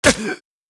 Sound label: Magi M Damaged